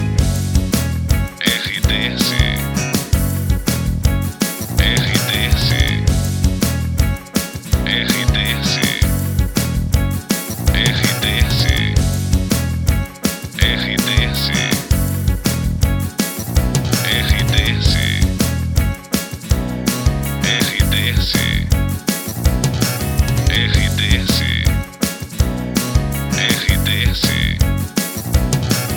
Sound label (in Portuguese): TRILHA PARA LOCUCAO POPULAR